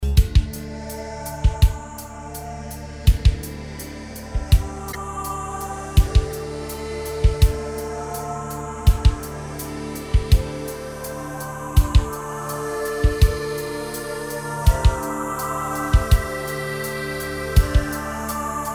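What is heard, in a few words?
pad background; volshebnyj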